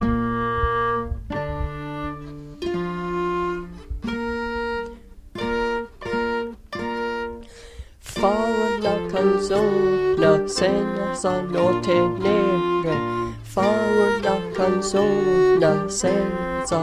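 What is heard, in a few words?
Alto Words; Fa una canzona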